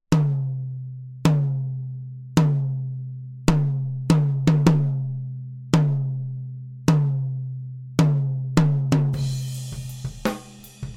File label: Tom Microphone MA200